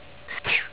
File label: Little sneeze